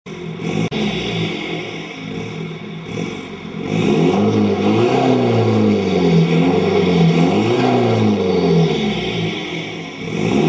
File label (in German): A3 2.0TDI stand; Der Audi A3 2.0 TDI im Stand; Sound- und Geräuschdatenbank